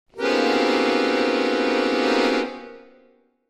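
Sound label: Accordion Keys Tension; keyboards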